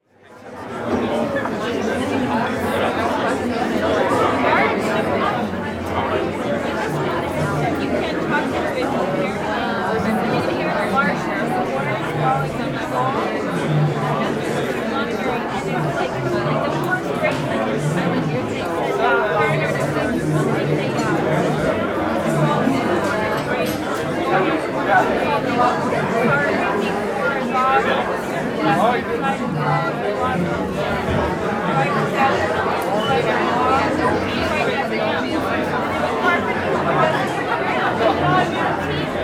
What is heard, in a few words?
Bar Pub Medium Crowd University Pub, Walla; Sound Ideas; Walla Series